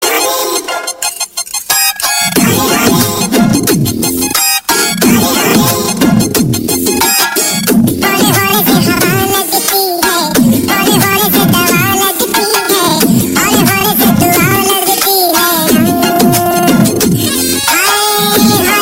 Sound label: funny alert